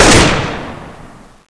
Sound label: ak inspect, weapons; cstrike; csgo